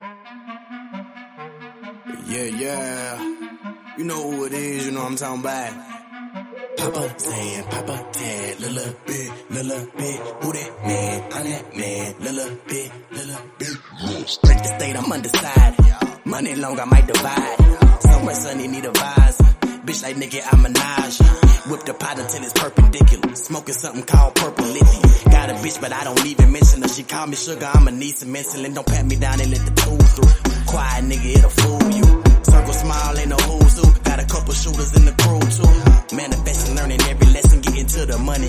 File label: BASS ROLL Remix